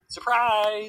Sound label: surprize; odd1sout